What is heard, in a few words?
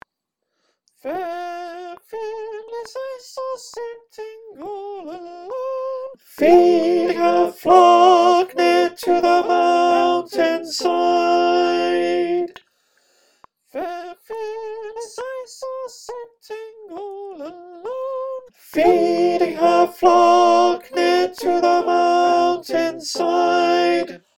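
Alto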